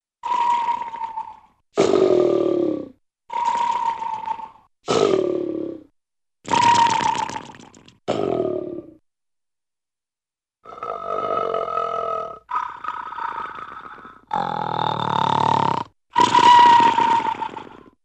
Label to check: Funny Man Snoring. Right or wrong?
Right